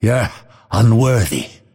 deny pain; dota; phantom assassin

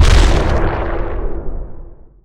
slam; weapons; dota; creep

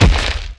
step, footsteps; warlock golem

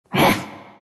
broo pain; broodmother

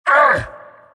abys pain; abyssal underlord

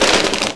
treant move; furion

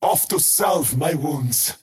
crown teleport pain; skywrath mage

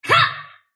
sylph attack short; dota; dark willow

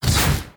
ranged attack; terrorblade

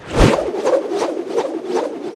bushwhack cast; weapons; dota; hoodwink

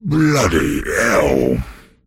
death; dota; pudge

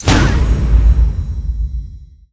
poof appear; dota; meepo

